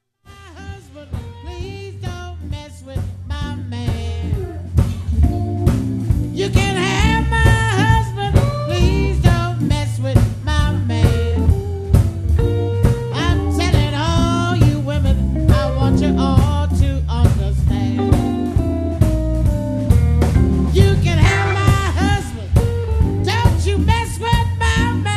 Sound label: Blues Singer